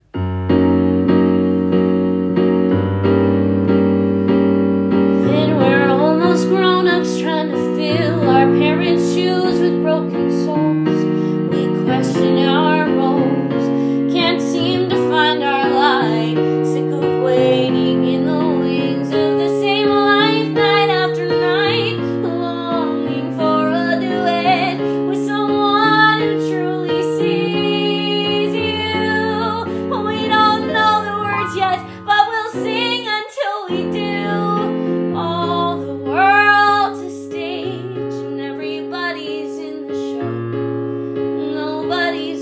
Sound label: Prologue VOCAL GUIDE